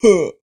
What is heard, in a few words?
troll, creature